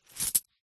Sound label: purse pouch leather coins inside tilt coins fast movement; foley